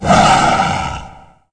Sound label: Monster